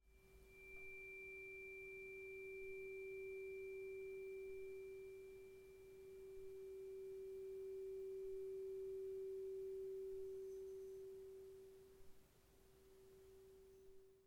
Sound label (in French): diapason chrome magnesium 384 hz; medson